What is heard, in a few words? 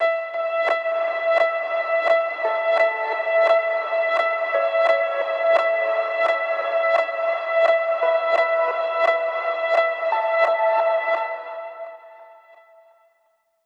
STUTTERS E 172; KULTURE